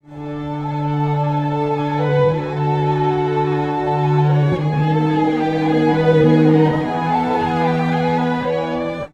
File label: strings; pitchshift; prosoniq time factory mpex3